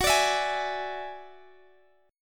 F sharp 7th Suspended 2nd Sharp 5th, Guitar Standard; x,x,16,19,17,16; strummed